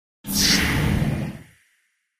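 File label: Space Door Open Slide, doors high tech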